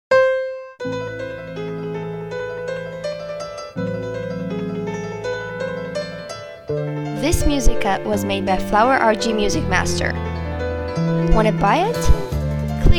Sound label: Mouvments music rhythmic gymnstics